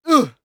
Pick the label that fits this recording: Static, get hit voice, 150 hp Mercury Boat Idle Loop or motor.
get hit voice